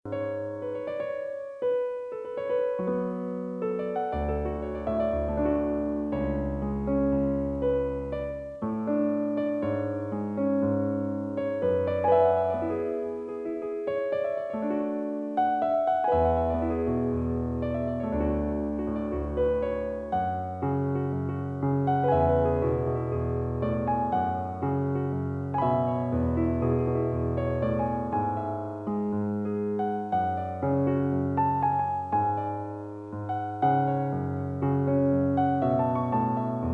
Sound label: Piano